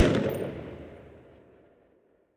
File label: Hispano LH End; Weapons; DCS